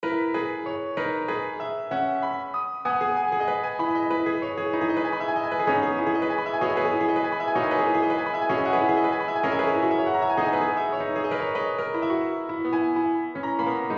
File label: Preludes No.7 in G-m